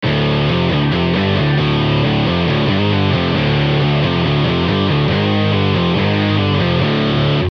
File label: Multiband Fuzz